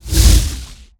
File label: spl poison fail